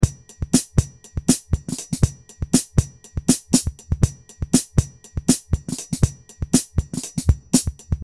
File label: homemade snare beat